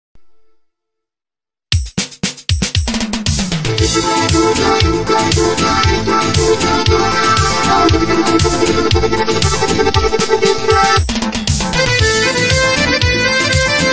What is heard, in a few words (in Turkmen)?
minus karaoke turkmen aydymlar minus karaoke